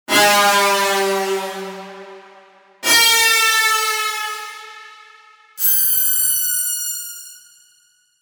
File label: Banshee; HALLOHORROR21